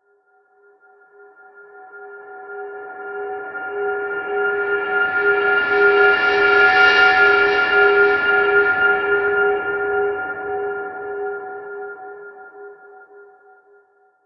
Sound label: Bell Reversal; Jimimod